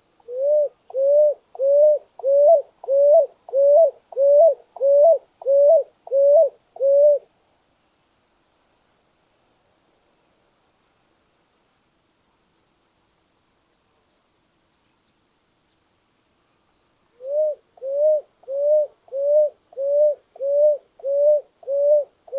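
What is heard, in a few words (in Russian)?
сероглазая земляная горлица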